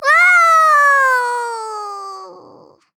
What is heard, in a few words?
Falling; Rider; Paladins